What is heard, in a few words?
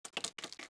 Rat walk; characters